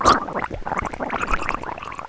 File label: DROWN